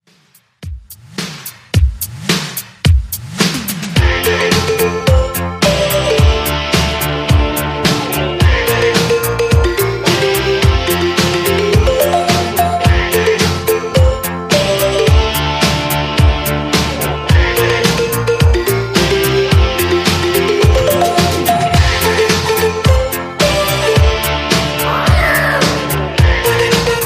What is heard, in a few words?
80s Redrum 108 Bpm